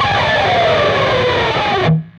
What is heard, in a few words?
PRS SCRAP, Guitars; PRS SCRAPES